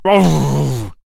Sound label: Miner Death Vocals